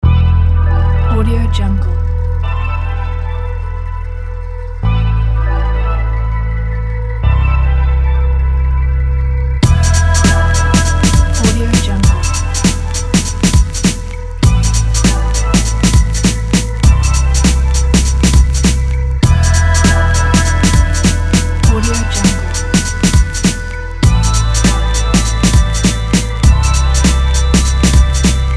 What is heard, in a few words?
vintage soft easy abstract; evokesound